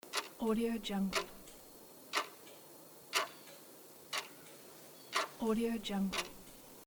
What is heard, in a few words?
clock